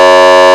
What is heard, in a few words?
horn, sirens; code3 rls